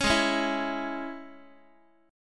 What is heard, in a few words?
Gsharp Suspended 4th Sharp 5th, Guitar; strummed; 4A,4E,5A,5D,5G#,6C#; x,x,4,6,5,3